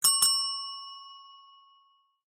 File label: Call Bell Tap Style